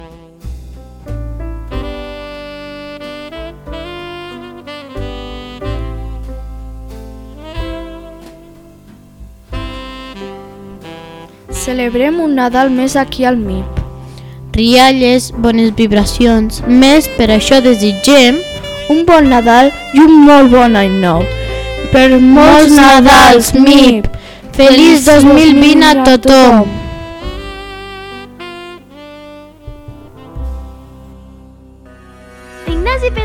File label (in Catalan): jingle nadal